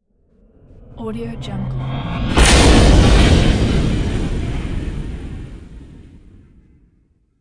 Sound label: trailers hit